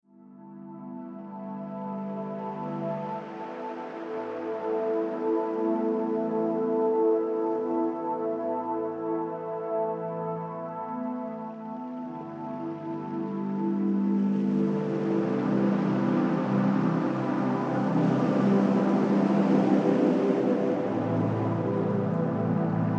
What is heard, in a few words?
Meditation Soundscape